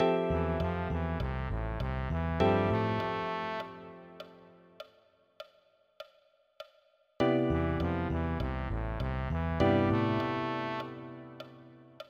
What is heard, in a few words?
Line over chord variations